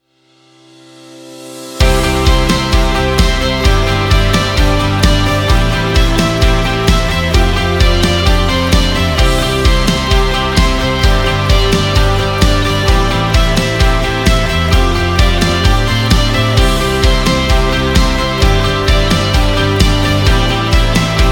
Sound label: Inspiring Corporate